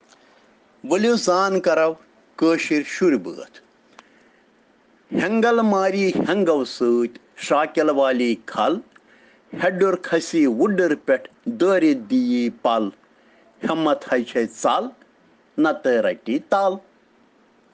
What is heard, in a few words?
Children Rhyms in Kashmiri